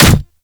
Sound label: Manic Claw Hit; Warframe; Grineer; Attack